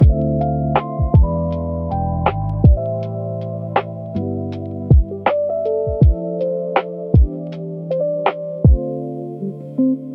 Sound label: Dreamy Lo-Fi Focus